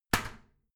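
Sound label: toilet seat lid slam regular, bathroom; haus-garten; badezimmer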